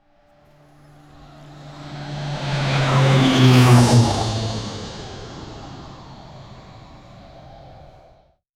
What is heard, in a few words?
airplane takeoff